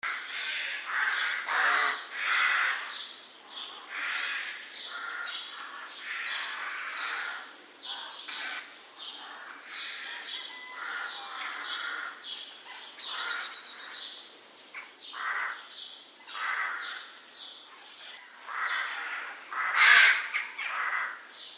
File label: birds rooks